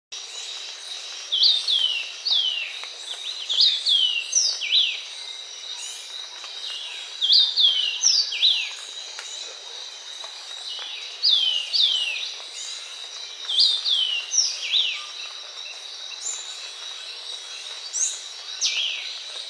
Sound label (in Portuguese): canto da pipira de asa branca